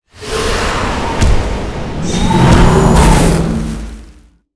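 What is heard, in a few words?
arm demon death, monster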